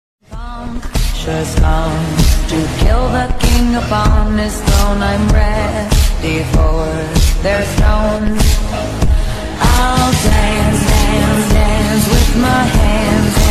ringtone lofi remix ringtone slowed reverb ringtone